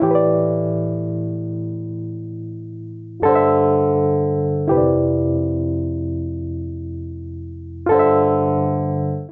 Progression AOR Rock i - VII - VI A Minor 103 bpm, Electric Keyboards; Ableton; Factory Packs